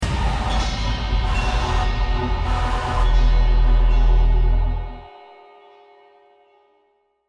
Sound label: deathcam